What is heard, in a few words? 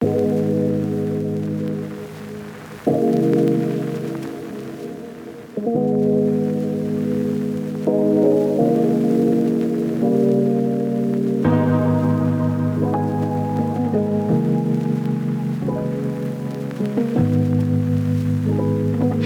Lofi